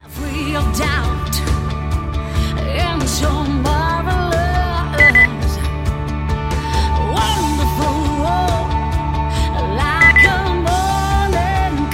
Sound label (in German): gesang